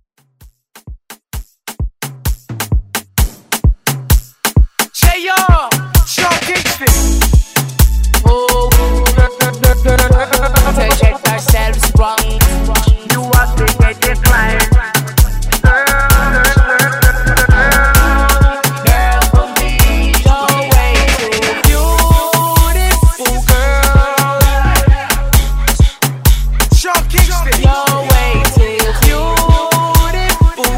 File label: Afro Hype Edit